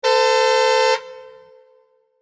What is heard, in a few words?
car horn